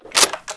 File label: awm bolt push, weapons; garrysmod